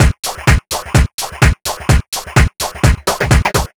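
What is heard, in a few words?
No snare, Drum Loops 127bpm; Zero-G; Soundclash Nu Dance Electro; Acidized Wavs; Ugly Funk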